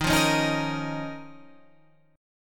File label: Eb 7th Suspended 2nd Eb, Guitar Standard; 11,8,11,8,11,11; Eb7sus2 strummed; JGuitar